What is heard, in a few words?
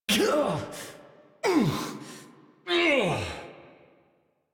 Struggle Long, Adult; Warframe; New War; Adult Operator